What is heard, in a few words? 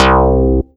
M-Audio Venom FMGrit C2